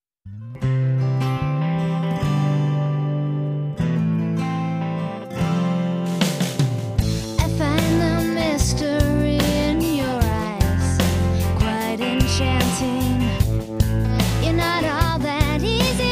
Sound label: Rock Track